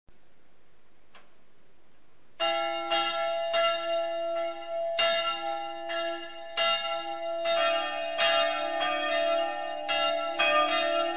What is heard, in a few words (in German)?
glocken